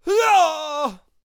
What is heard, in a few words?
Attack Vocals; Devout Gauntlet